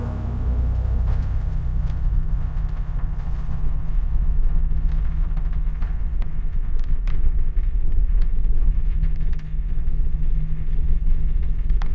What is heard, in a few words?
fire crackle; sound effects